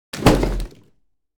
Simple wood hit impact

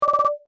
Digital interface short scroll menu ui

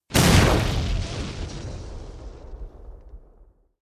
Massive explosion close perspective

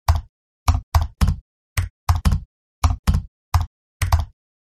Simple text typing; shorts sound effects

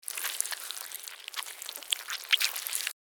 Worm slithering movement short; slug and worm

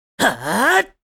Attack shout anime boy vocalization; gfxsounds

